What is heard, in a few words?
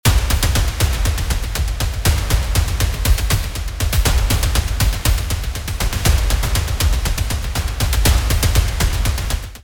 Trailer loop